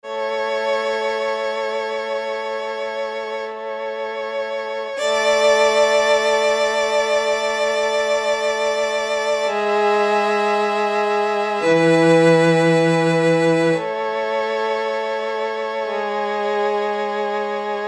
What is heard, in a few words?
Quartet Str